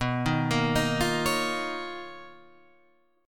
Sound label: B Major Sharp 11th, Guitar, Standard; BM#11; arpeggiated; JGuitar